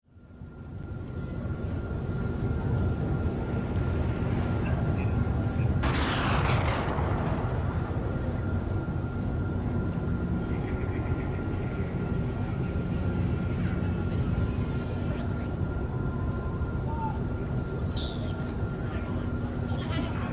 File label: amb market; ambiance; environment track; tatooine